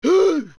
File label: Cursed Spider Attack; zelda; OOT